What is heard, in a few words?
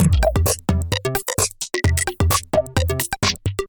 Techno